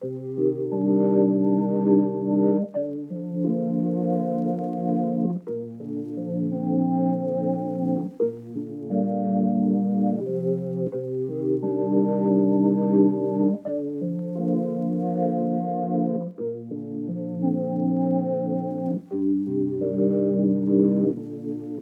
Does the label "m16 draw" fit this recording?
No